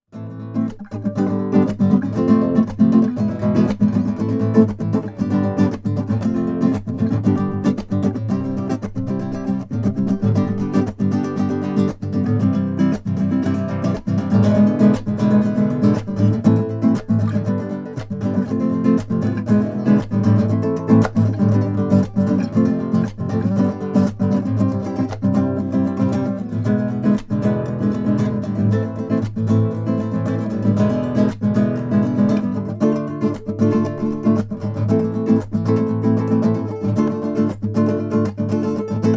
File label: maingard crossover nylon